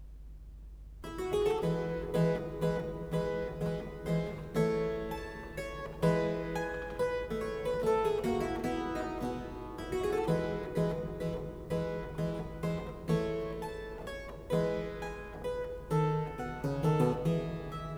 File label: Allegro in F major, Keyboard Works; Mozart